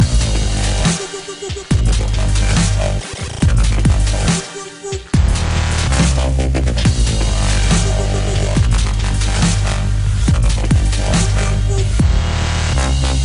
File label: dubstep; saints row 3; electronic